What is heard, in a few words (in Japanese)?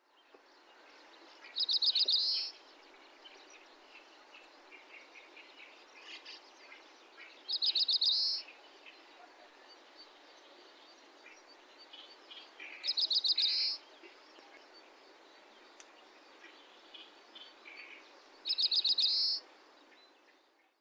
kawarahiwa; ookubo